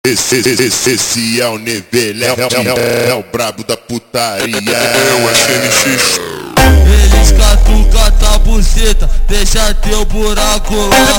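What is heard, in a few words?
super Slowed, phonk music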